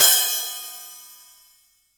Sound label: CYM, Real Drum Kit; Best Service ProSamples vol.43; JUNGLE KIT